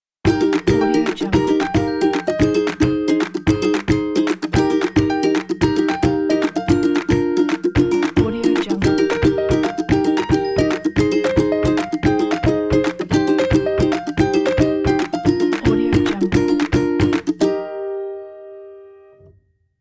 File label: comedy logo